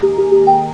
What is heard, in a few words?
Doorbell; Babylon 5